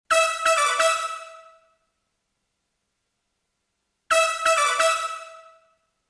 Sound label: Brass Korg DRV 2000 Small Hall Reverb Vintage Digital